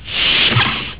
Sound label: trek door